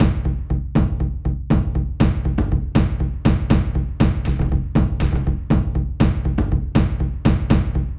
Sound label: Main Drum; Zuma Main Drum